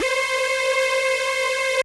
Synth C, Synth Prophet One Shots; Electronisounds

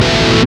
GUI, 100 BPM

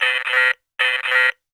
TOY TALK, TOYS; AKAI S6000 CD-ROM - Volume 6

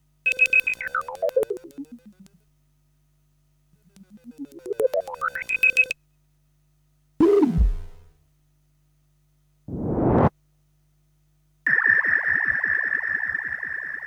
Sound Effects; Kniteforce; 1000 Samples Volume 1

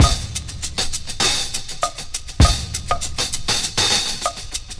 shuffle n shake, 100bpm

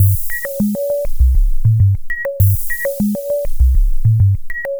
Percussive

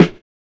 LO FI SD, Drums; USB Soundscan vol.11; AKAI

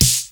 SLAP; 90 sSampleCDs; Crypton - DrumBase5000; DANCE KIT

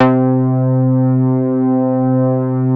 MOOG BASS C4; Best Service Dream Experience

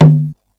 Low Bongo, Elka Drumstar-80 drum machine; 300 Drum Machines

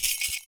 FOOT PERC; Univers Sons - Raricussions

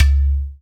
UDU, Africa; Roland LCDP14 Africa VOL-2; Afro Menu 1

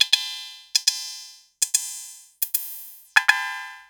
Vermona DRM1-MK2 Hi Hat; Sliced

